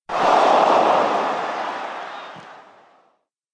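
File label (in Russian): реакция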